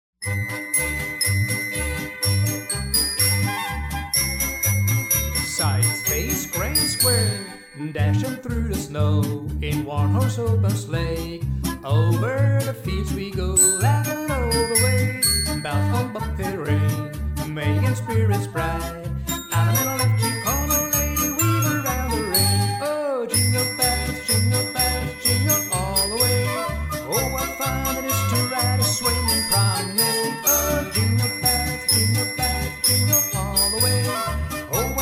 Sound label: jingle bells vocal